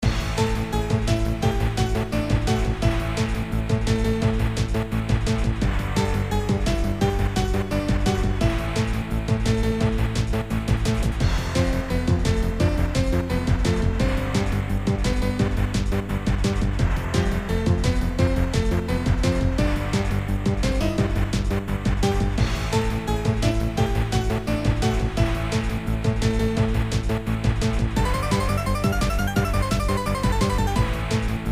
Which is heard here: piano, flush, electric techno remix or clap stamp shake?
electric techno remix